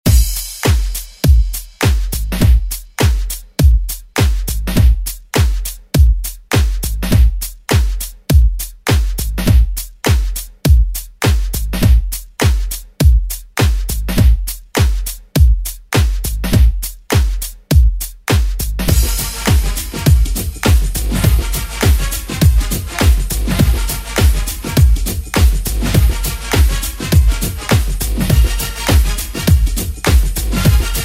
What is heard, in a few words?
90s ReDrum; DJ Mhark